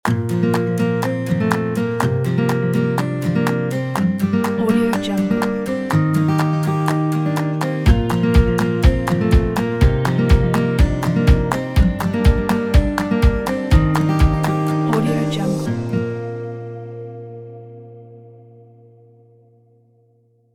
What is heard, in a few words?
happy logo